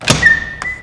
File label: METAL DOOR OPEN